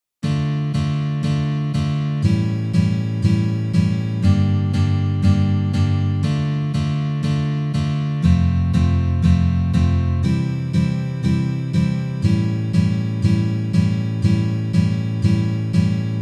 Uprazhnenie s akkordom A7

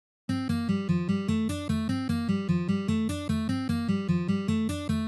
Vertushki na gitare; taby

